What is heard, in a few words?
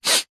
human male short sniff; zapsplat